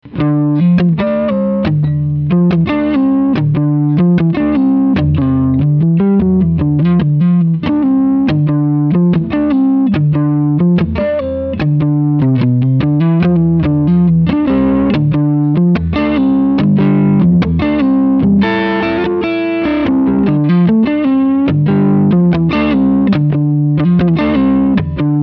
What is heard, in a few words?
Blues playalong Neck